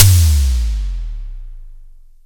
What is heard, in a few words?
Extra Long Decay Floor, SDSV Tom REAL Long Decay; Simmons Drums